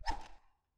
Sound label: Knife Swing; Scrap Weapons; Zomboid